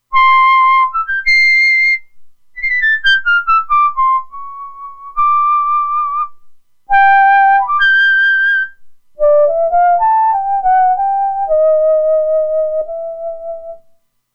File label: Flute, Roland Alpha Juno-2; Factory 2